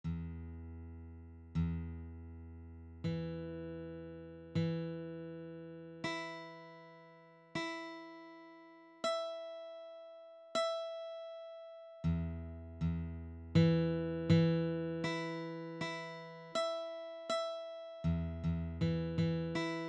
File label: meditation; children album